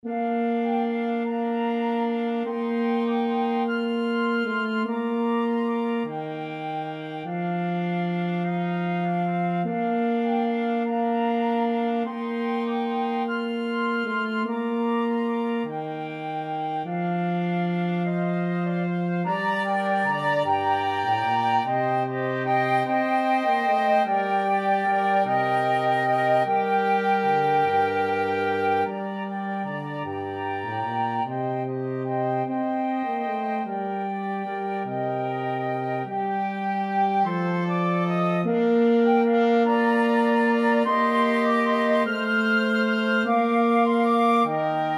flexible mixed ensemble, 4 players

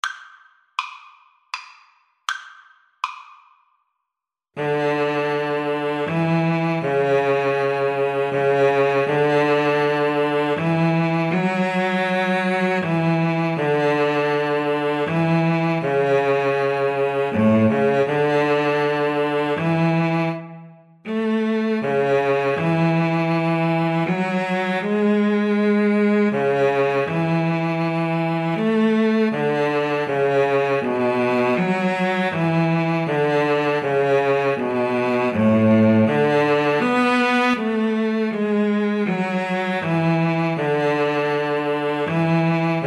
holst jupiter, Viola Cello Duet

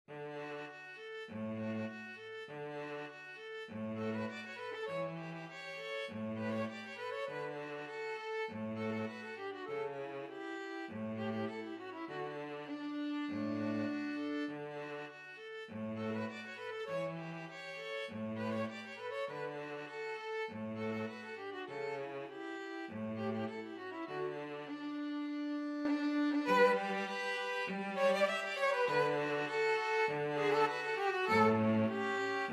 string trio

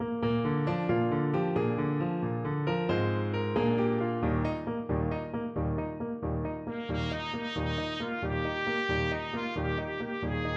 trumpet

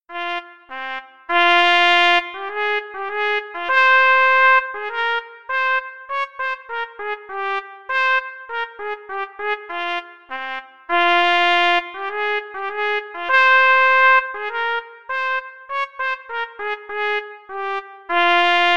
Prelude trumpet duet

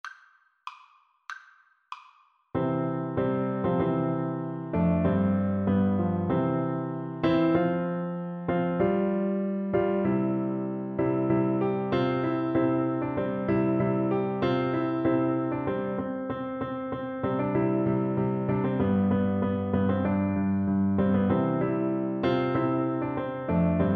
Trombone

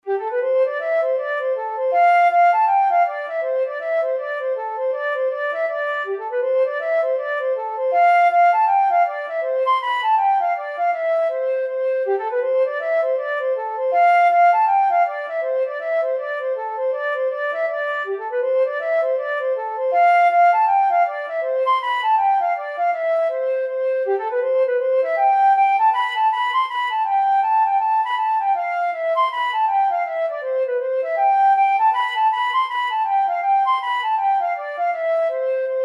prize for the ladies, flute

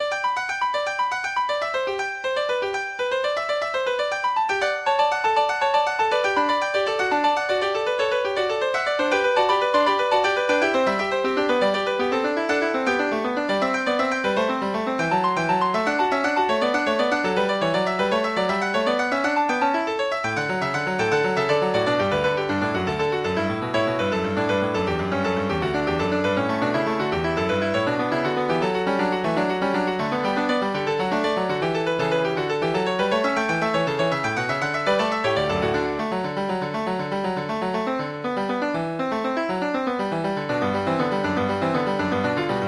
gigue, piano; french suite 5

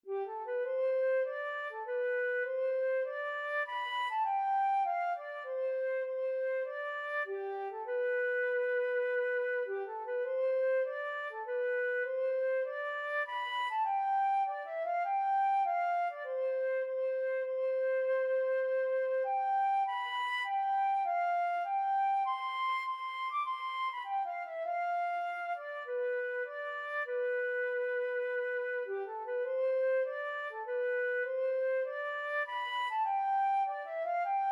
wind blow, flute

